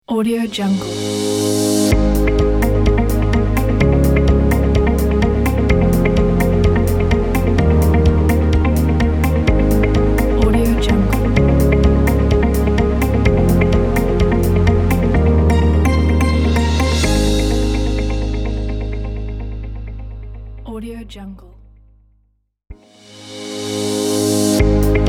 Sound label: motivational corporate logo